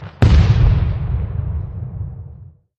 Strong Explosion Blast

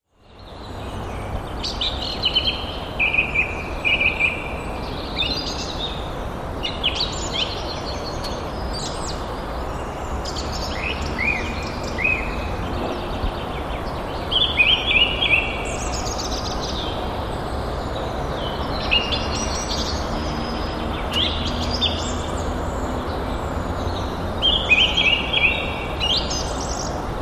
Forest Birds Ambience

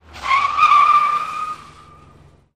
Tire Screech

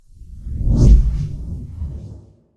Fast Blow Moving Whoosh

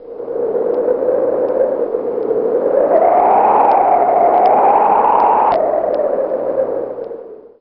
Strong Wind

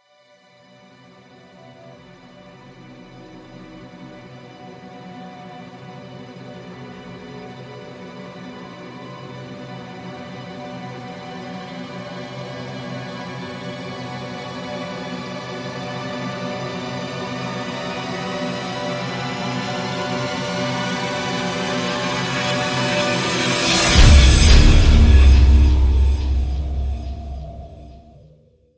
Trailer Strings Rising Hit

